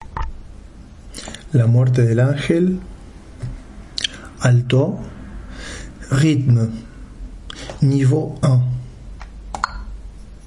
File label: ALTO